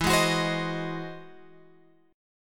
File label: E Augmented Major 9th E down Guitar Open F; strummed; E+M9; JGuitar